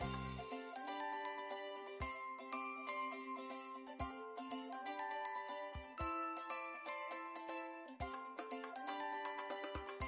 music cheerful; bgm

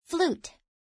flute

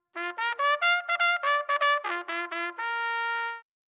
trumpet

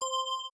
click multimedia

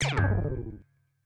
wrong anwer

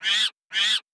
monkey infant

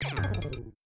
wrong answer

